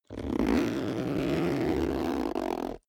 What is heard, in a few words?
zipper backpack close slow